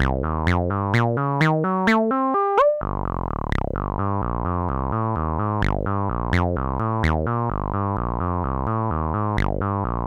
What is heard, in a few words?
saw